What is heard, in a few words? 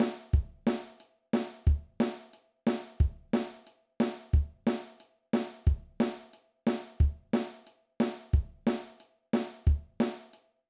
Basic Drum Beat